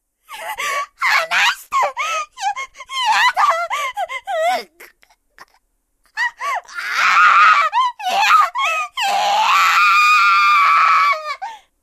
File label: scream; 演技; 痛い悲鳴